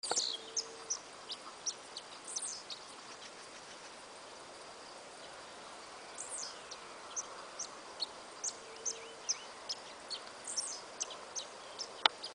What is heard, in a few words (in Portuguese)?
Som Beija flor de orelha violeta